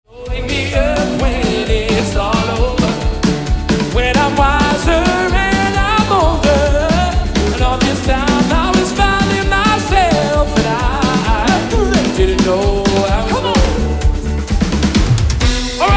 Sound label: Soul Band; Surrey